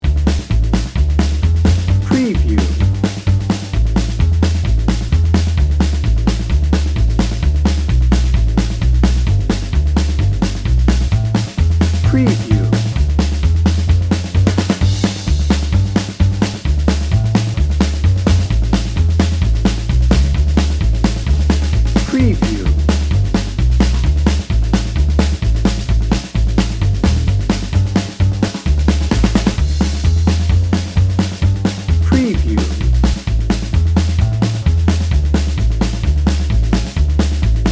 bluegrass dnb

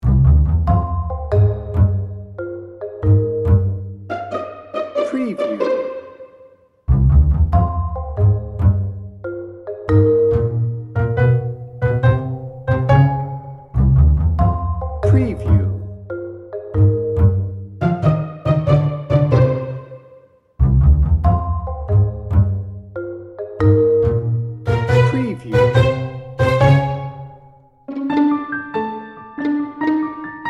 busy pluckers no drums percussion horns

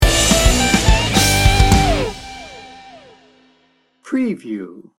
pop rock revival sting bumper

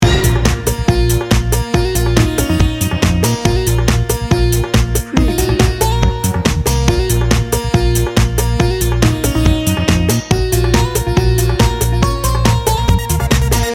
bollywood loop

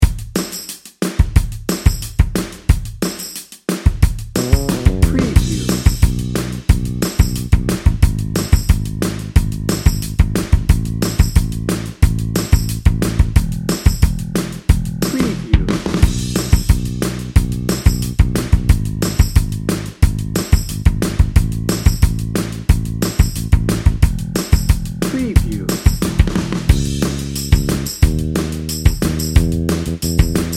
americana

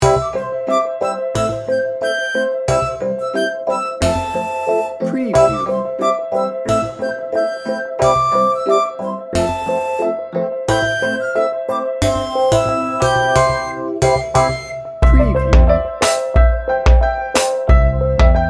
child like and upbeat

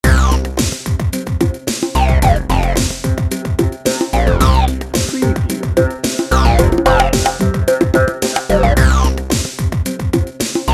funk feel good bed

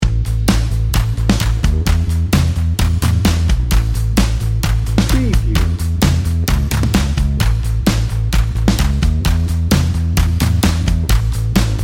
fly away loop

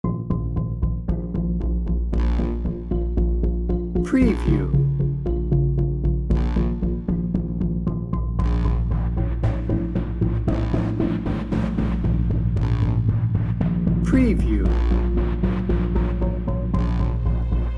stalker menace no drums

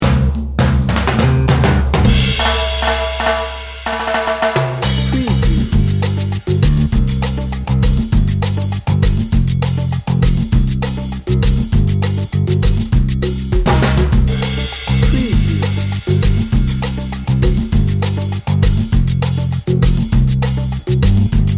dnb

